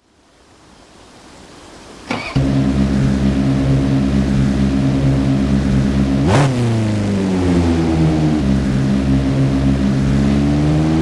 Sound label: mitsubishi lancer evolution 9; TDU-1